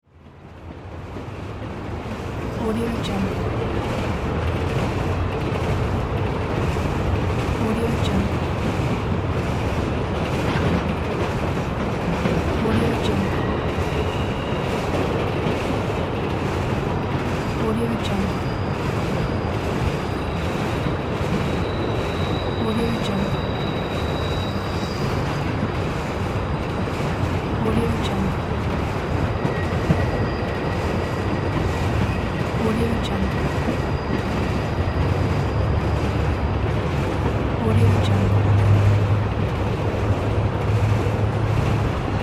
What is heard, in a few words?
train